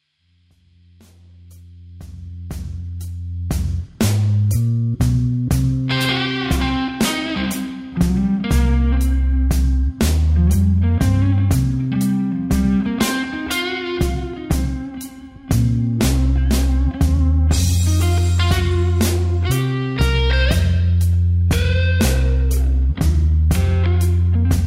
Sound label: Neck Fender OD solo, humbucker